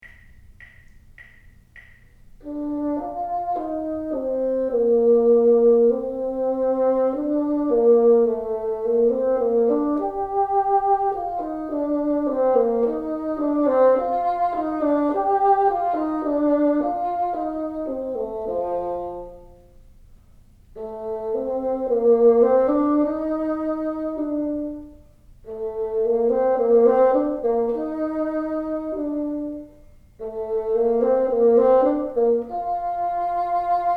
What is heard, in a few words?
teacher, bassoon